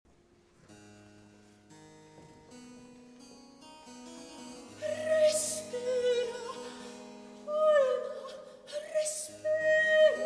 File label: Recitativo; Respira, Alma, Respira; Polifemo